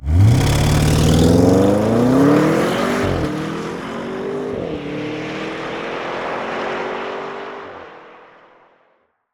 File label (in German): Audi RS7 Beschleunigung aussen; my2020; rs7 sportback